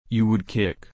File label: kick